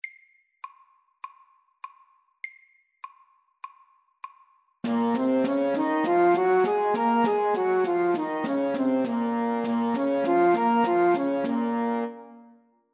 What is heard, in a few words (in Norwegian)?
B moll natura FORT